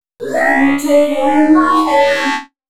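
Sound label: female g# 125, Dance Ultra Voice, adlibs; Mutekki Media; Dance Ultra Voice VOL-1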